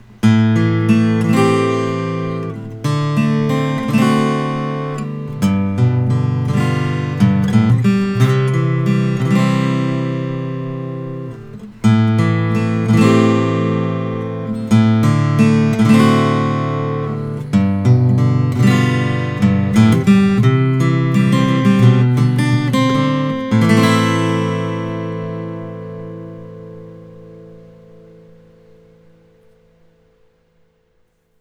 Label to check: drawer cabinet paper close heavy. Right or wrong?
Wrong